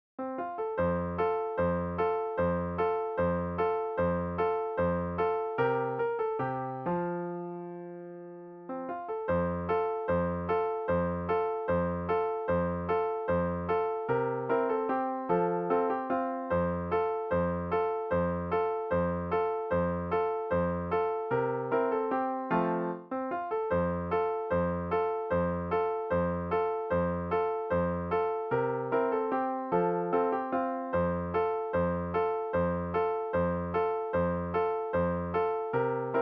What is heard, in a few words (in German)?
sechs loeffel mit melodie